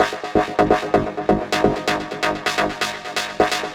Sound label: VFX2 Loop 128 BPM